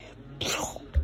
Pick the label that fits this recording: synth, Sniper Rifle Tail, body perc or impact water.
impact water